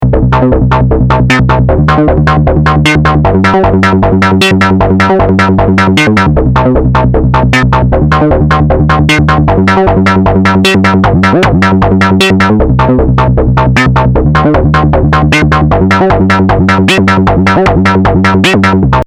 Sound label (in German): microbrute Basslauf